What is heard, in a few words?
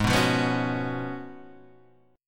Gb Major 7th Suspended 2nd Suspended 4th